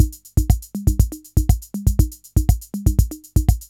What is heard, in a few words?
CR-8000 LOOP, DRUM LOOPS